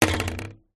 household swimming pool net pole metal down on surface